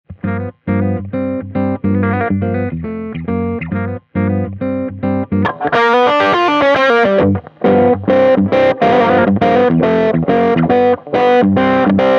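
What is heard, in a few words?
rhythm lead, pedals; gboy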